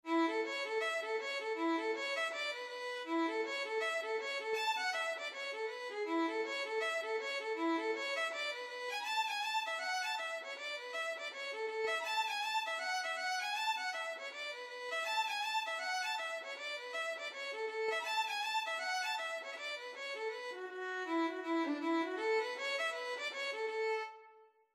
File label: ugly, violin